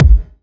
drum hit finish

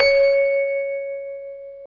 Bell C#5

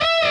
Bend full Release

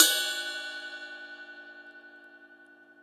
ride cymbal

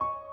piano high

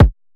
drum hit whistle

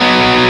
x x 4 4 2 Bm; osu

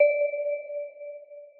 Bell D6

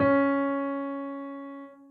soft hitwhistle

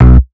bass staccato; osu

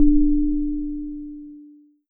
bass D

